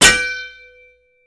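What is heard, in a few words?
Sword Clanks Single; swords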